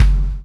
CHAIN; Spectrasonics - Backbeat

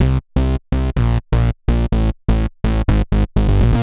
125 C, bass; musicradar; bass boutique

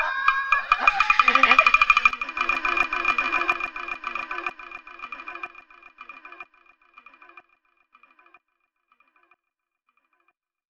kabuki; Sonic Foundry (Sony Creative Software); Ugly Remnants VOL-2

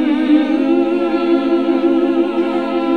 SING, CLASSIC VOX; Best Service; Hallelujah

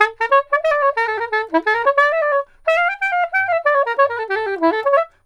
SAX, Balkanish Traditions

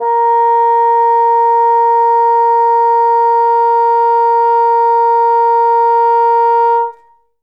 Bassoon A#2; Best Service ProSamples vol.51 - Classic Orchestra 2

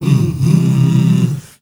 MALE HUM, M. HUMMERS; Spectrasonics; Heart of Africa

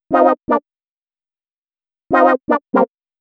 120 synth licks C, Keys, Nu Jazz House; PowerFX

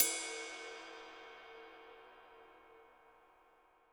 HiHat & Crash, Roland TD-20; HiHats

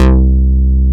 MOOG, Source Bass

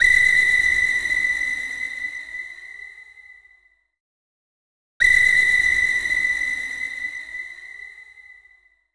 Wind Train FX; Zero-G Smoke

